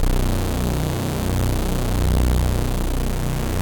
Super Saw B0

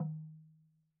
Log Drum Hi, Slit Drum; Idiophones; Struck Idiophones

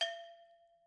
Brake Drum Susp, Struck Idiophones

